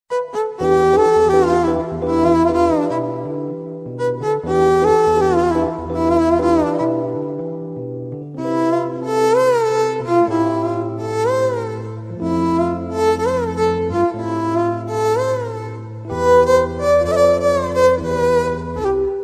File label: feel the music best ringtone malayalam ringtone